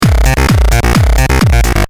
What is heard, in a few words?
BASS; mazda7